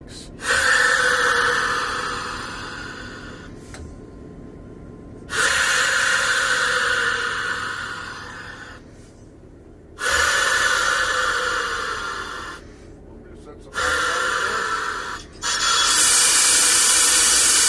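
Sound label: Diesel Locomotive Apply Brakes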